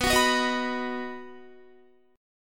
B Major, Guitar, 4F,4A,5C,5G,5C,6E; strummed

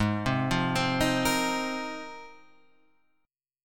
G sharp 6th Add 9th G sharp Guitar Standard; arpeggiated; JGuitar

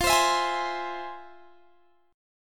F Minor Major 7th, chord

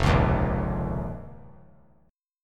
F 7th Suspended 4th Sharp 5th F down; F7sus4#5; strummed; JGuitar

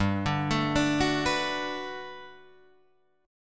G Major 9th, G, down, Guitar, Drop D

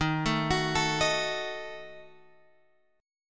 D sharp Diminished D sharp Guitar Open G

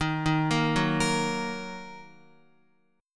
Eb Minor Eb Guitar 4F 4A 5C 5G 5C 6E; Ebm arpeggiated; JGuitar

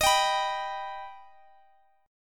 Eb Augmented, Guitar Standard; strummed

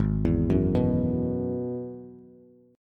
Ab Minor 7th Sharp 5th Ab, down, Bass; arpeggiated; JGuitar

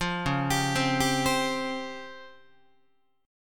Db Major 7th Flat 5th, Guitar; DbM7b5 arpeggiated; JGuitar

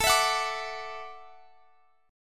A 6th Suspended 4th, guitar

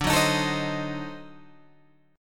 D 7th Flat 9th D down Guitar Open G

